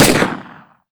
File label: hpsa shot, weapons